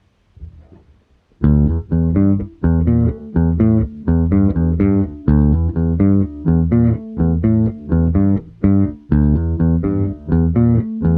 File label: bass; stealth